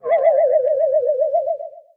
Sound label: Troll Dodger Dizzy